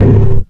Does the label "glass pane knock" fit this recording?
Yes